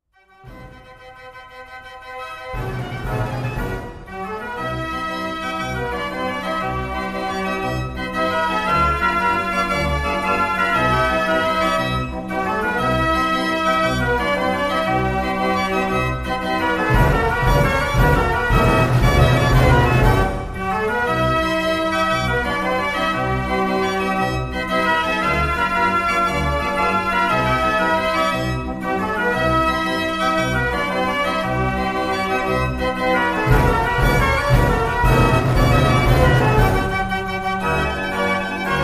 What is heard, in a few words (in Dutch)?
Molto Vivace, draaiorgel; 70 jaar De Turk